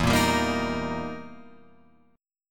E Minor Major 7th Flat 5th, E, down, Guitar, Open F; strummed; EmM7b5 chord; JGuitar